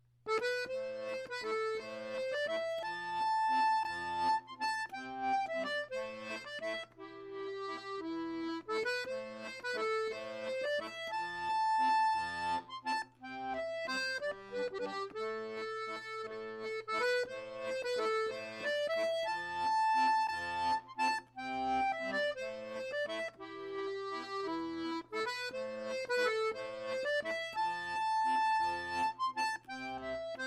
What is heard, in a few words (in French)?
valse ecossaise; rencontres-2013